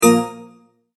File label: multimedia tone exclamation; McKinney Sound